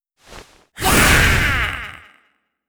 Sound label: Heavy Attack; netent